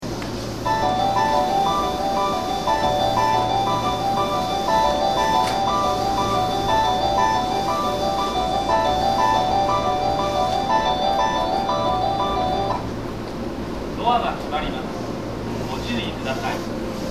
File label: melody